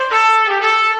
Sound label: trumpet danger; music